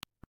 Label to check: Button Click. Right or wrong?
Right